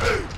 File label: Hat, Closed Hats